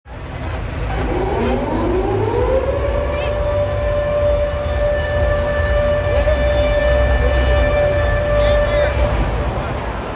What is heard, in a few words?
SIREN